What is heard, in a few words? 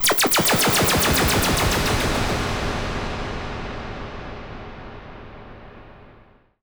Virus Noise